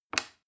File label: plastic button small release regular